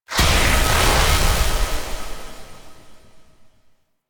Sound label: Zariman Power Rift Ready; Warframe